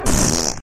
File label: Bonus Miss